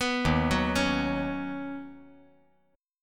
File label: Eb Minor 7th Sharp 5th Eb